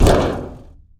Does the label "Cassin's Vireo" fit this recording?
No